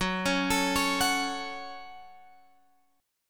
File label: Gb Minor, Guitar, Open F; Gbm arpeggiated; x,13,13,16,16,13